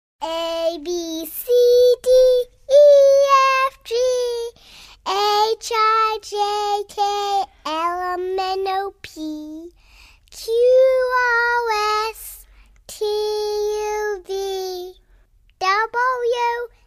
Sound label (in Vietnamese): Nhạc chuông em bé hát bảng chữ cái